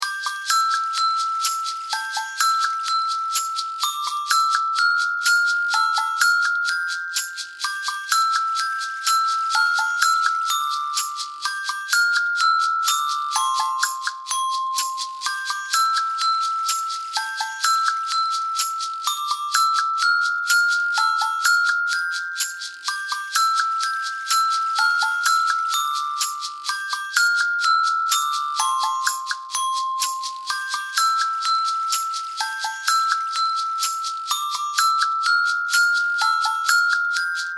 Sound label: Good Morning CHIME BARS ONLY